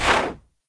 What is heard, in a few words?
movement snort; sobek; smite